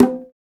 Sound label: PRC, Bongos